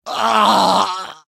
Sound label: pain severe; engineer; source engine; tf